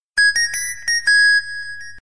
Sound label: Nicebell Sms